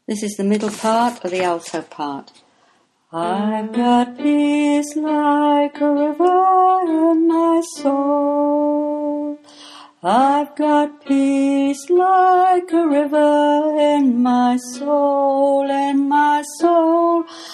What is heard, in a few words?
alto